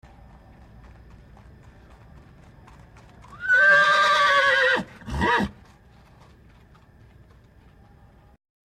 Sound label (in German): Pferd